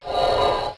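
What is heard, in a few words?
underwater breath; lmctf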